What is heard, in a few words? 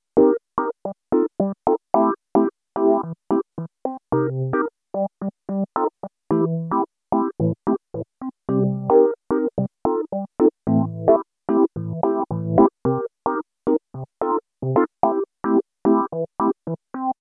Suitcase Phased EP, Electro Lounge; Sonic Foundry (Sony Creative Software); 110 Kickin